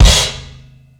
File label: Kick Crash Combo, Kick One-Shots; KJ Sawka - Drum n Bass & Beyond; Drum Tool Box